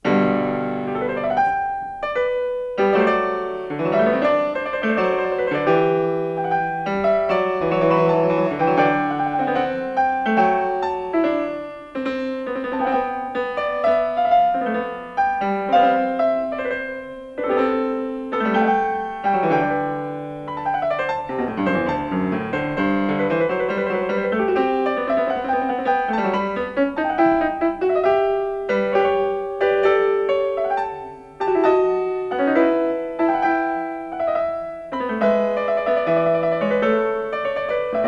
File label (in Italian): Variatio 16. Ouverture a 1 Clav.; 1955 Recording; Bach; 골드베르크 변주곡